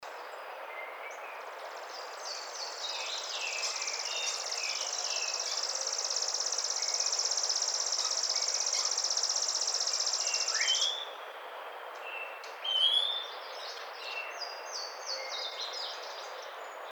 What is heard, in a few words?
Chipping Sparrow